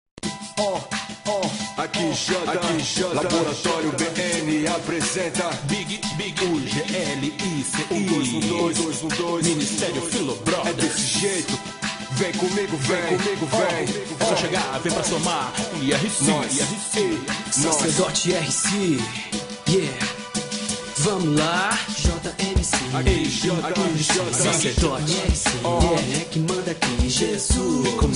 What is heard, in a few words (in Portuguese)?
rap gospel